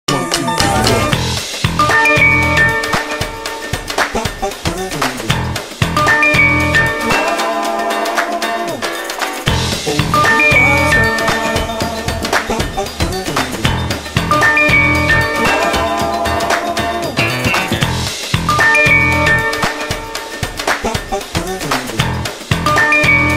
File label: Samsung Galaxy S8 - (Ringtone)